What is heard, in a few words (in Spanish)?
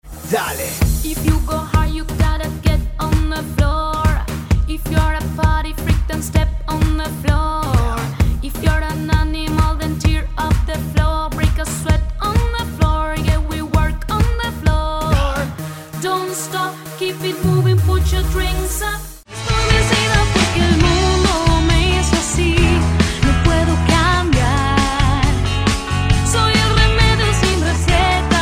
Canto Mix